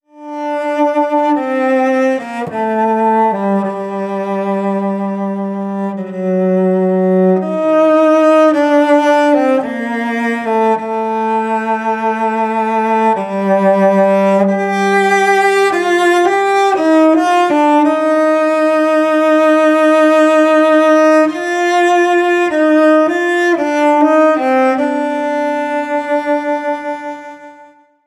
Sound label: Adagio, cellist